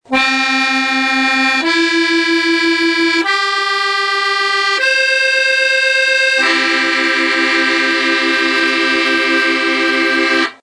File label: professional special musette scot